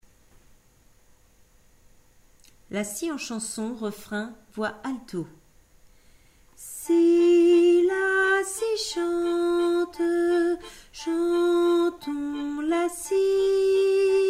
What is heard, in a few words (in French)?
refrain alto; la scie en chansons